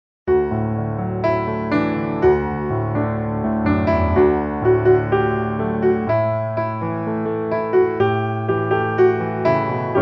piano

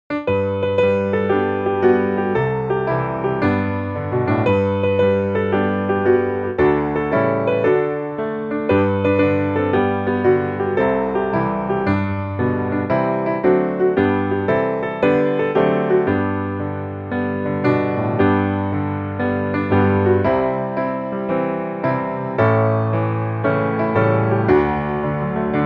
Jesus a cada dia atrai o nosso coracao piano

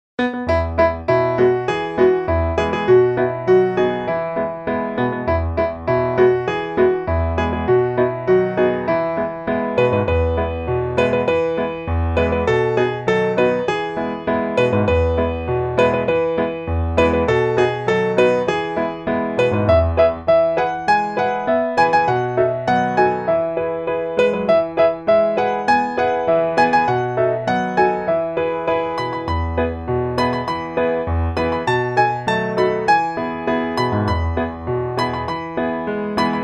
Alegrai vos sempre no Senhor piano

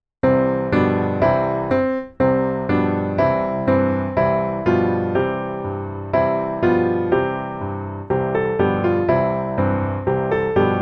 Nao seja orgulhoso piano

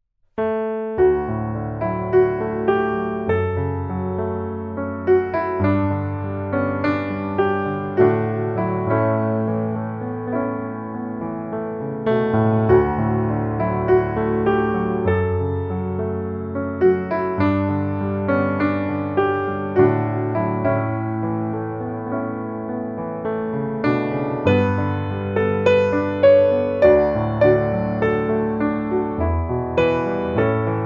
Senhor Davi jurou piano

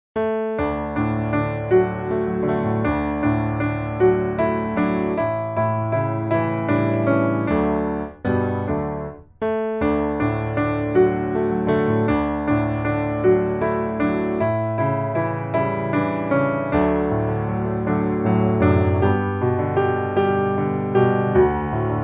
piano

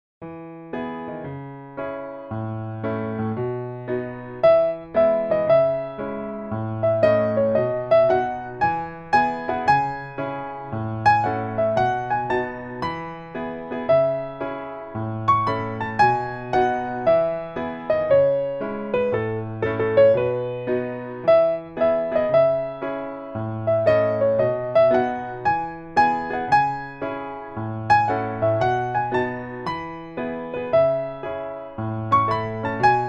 Amo o Senhor piano

